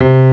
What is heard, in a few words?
steinway b piano; tumbledemerald